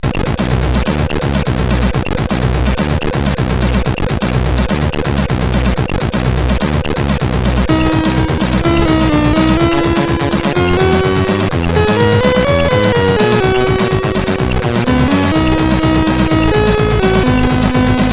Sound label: Christmas, CSG8580R5